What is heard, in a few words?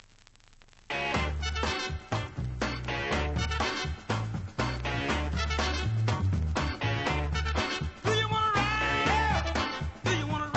funky soul train